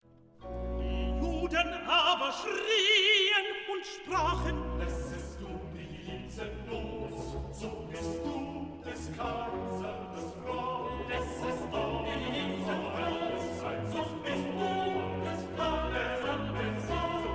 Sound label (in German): ALT, Stimmen mit Klavier; Johannes Passion